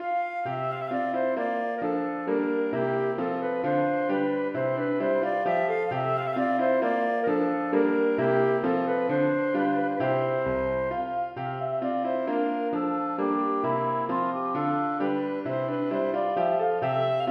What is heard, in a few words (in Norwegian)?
for fløyte, klarinett og piano; Europo Glante